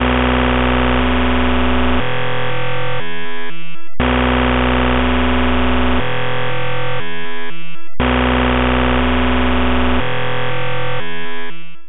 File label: bytebeat